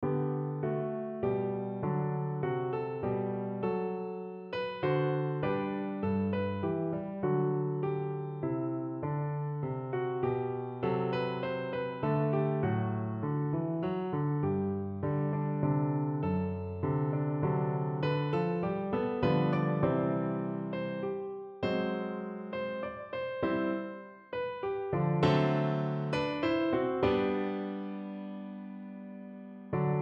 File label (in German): menuett